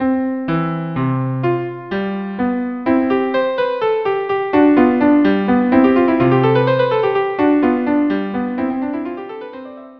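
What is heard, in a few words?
viola duo minuet; KV 487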